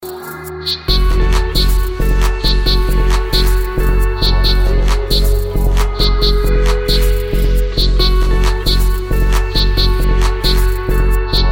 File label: Tribal Earth